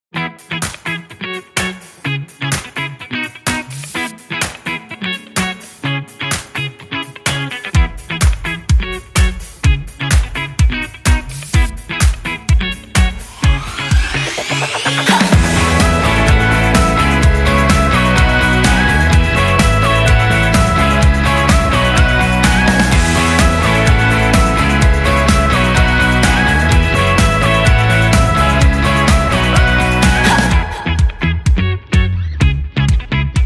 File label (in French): Musique